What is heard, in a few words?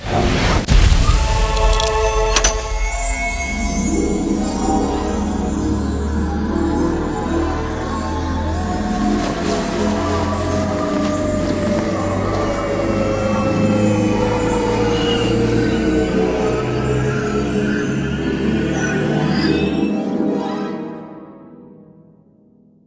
freespin lock up; finn